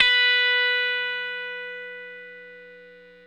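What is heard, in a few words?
electric guitar sus B5 right; Sampleheads; Whole Lotta Country 1 & 2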